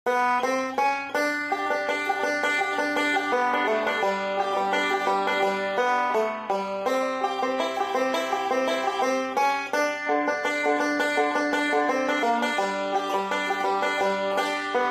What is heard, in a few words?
Banjo Man of Constant Sorrow; Instruments; Zomboid; Lifestyle